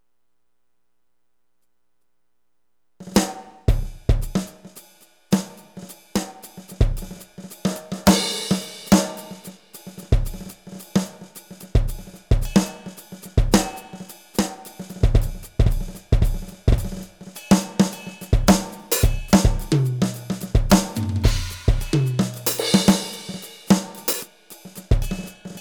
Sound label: bigband beat